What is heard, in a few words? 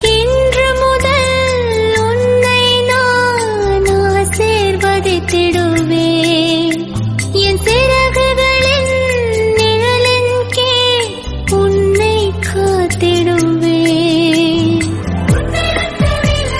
Christian Tamil